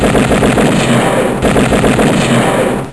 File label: INFANTRY; MAMMOTH